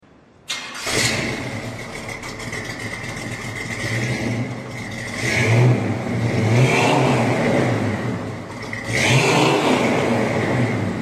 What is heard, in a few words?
TransAm 455cid, exhaust sounds